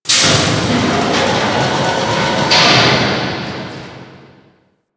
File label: Ocean Conveyor; Ambience; Warframe; Grineer Ocean